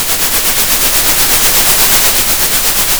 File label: pop, Sound of a train